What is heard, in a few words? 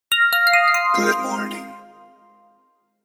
Space clock alert; Nokia N79; Audio themes